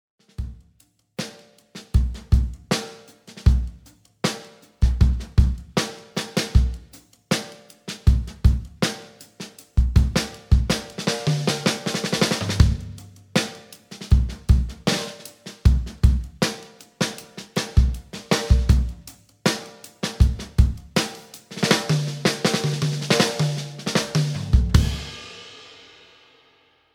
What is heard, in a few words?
big beat natural hi tuning mid tempo, drums